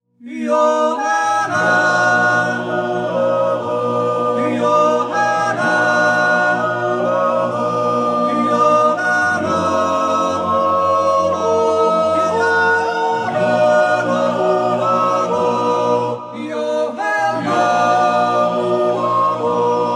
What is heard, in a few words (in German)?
jutz; schwaendialp